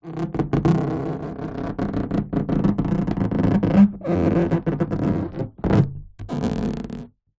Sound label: balloon inflated rub creak squeak; foley; zapsplat